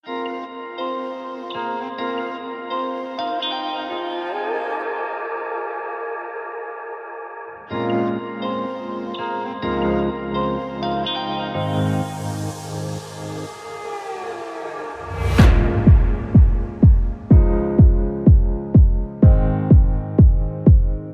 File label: Instrumental